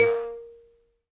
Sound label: PLK HIBZ, Buzz Kalimba, Africa; Roland LCDP11 Africa VOL-1